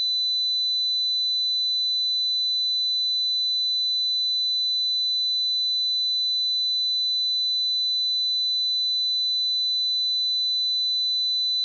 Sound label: 2 kHz Sinus 20db; 4 kHz Sinus 20db; IPmotion Test Drive